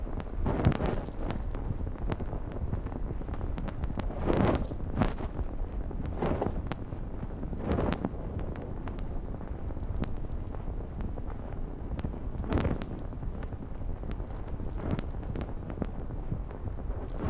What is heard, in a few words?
CANDLE IN THE WIND Fire sound effects